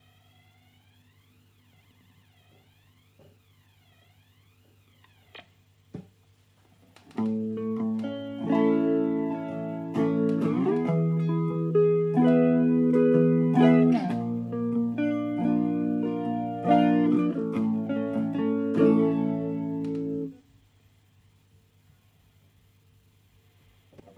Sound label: Noisy